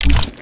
ui button rollover large; csgo

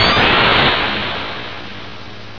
sprint; weapons

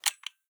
m24 insert, weapons

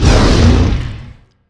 angry, dragon

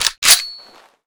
m14 bolt release, weapons

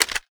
famas mag out; weapons

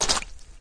walk, flood

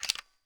m3s90 load

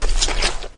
run, flood

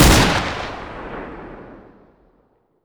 shoot; thompsom

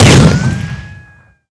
sniper fire; weapons

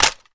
ak47 magout empty; weapons

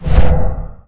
tail, dragon

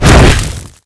flame, dragon

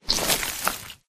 human form short move; flood

